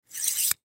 Needle Put In, Needle Put In Plastic Tube; Surgeon Surgery Four Inch Disposable Bone Marrow Biopsy Aspiration; Hospital; Emergency

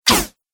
Robot Strike Attack; Robot Sound Effects

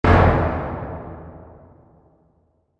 Cool Heavy Bang; Low Impact Sound Effects; explosion sound effect; Andrew Fleming

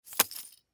Dog Leash Metal Chain Drop; Jangling; Foley; Sound Effects:Objects; SoundEffects-Blastwave

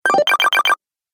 Retro Videogame Fx Bleeps

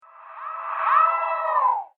Reverse Howl; Monster Sound Effects; horror sound effect

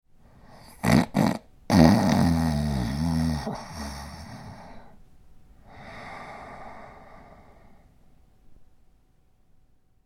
Snoring Male Snore; Snoring Sound Effects; human sound effects

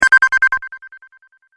Tap Play Tremble, tapping

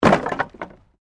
Wood Movement Medium; Material components for constructing more complex sounds; Materials

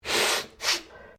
MALE SNIFF; Male Vocal sounds; Sick (Sound Effects:Human)

